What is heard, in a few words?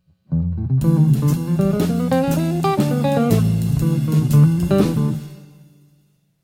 Melodic Line